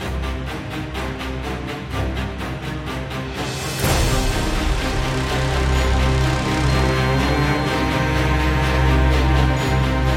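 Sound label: Honor, epic cinematic trailer music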